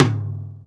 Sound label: Toms